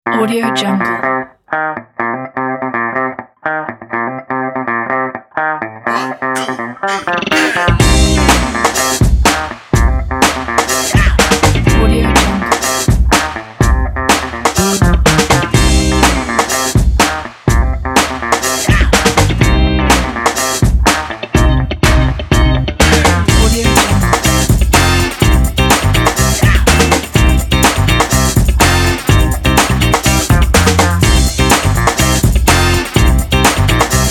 funk

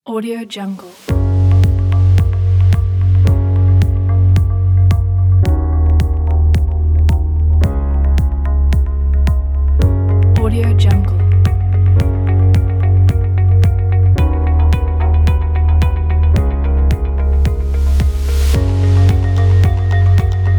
ambient uplift kit

